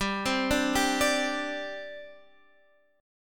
G Suspended 4th, Guitar, Half Step Down

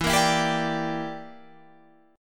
Bb 6th Flat 5th E

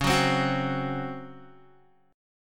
Db Major 7th Suspended 4th Db down Guitar; strummed; JGuitar

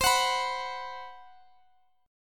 B 5th C, chord; B5/C; strummed; JGuitar

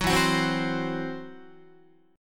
E Suspended 2nd Sharp 5th, Guitar; Esus2#5 strummed; 9,12,9,10,10,11

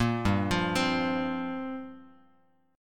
G Minor 11th, Bass Standard; Gm11 arpeggiated; JGuitar

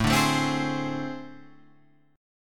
A Minor Major 7th Double Flat 5th, down

